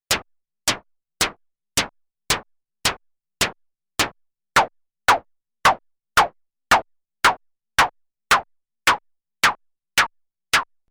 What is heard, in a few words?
Snare